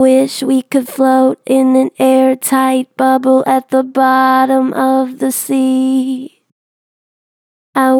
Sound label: Gen Vib Wish I could float, Vocals, Natural Vibrato Gentle; Harmor Vocals